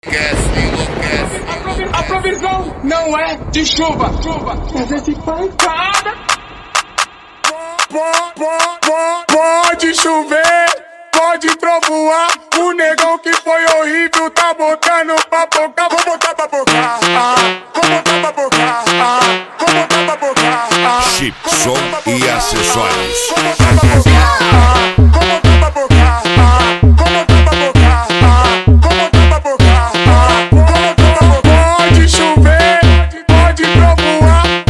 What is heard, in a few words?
especial funk